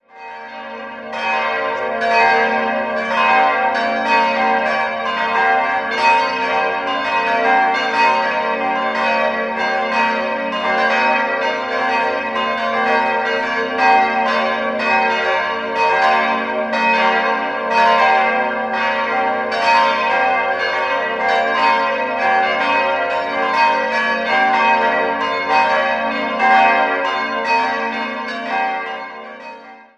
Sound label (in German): Aschach, glocken